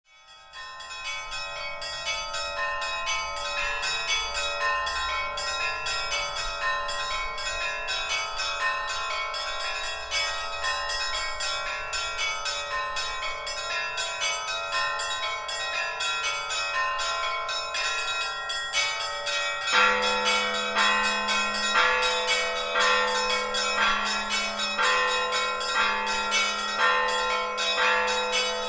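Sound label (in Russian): церковные колокола целебный звон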